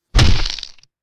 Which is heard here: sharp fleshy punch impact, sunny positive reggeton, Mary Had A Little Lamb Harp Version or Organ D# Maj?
sharp fleshy punch impact